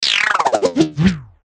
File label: Descend Metal Warble; Descending Sound Effects; Blastwave